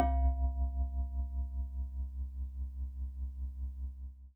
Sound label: C2, Synth Line